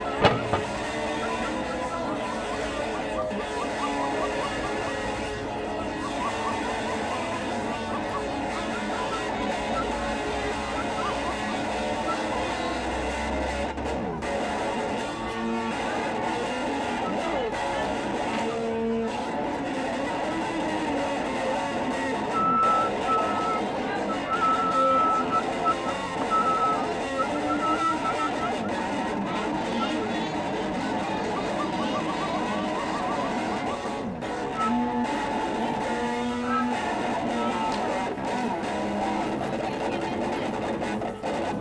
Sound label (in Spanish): Rock etnico callejero